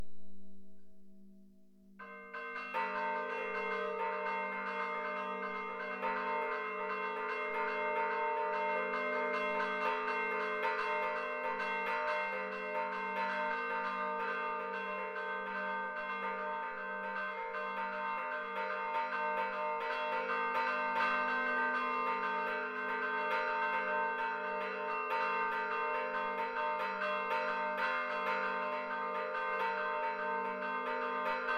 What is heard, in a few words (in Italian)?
SUONATA di conclusione, campane; Le campane del campanile di Santa Maria del Piano